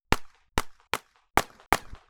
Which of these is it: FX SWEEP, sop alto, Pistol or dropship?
Pistol